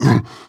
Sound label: breath short, zombie; creatures